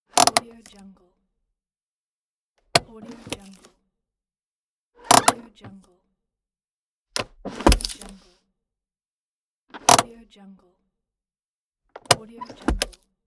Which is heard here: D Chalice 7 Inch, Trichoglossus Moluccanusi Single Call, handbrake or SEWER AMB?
handbrake